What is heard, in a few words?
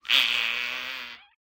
Fart Noise; SoundsCrate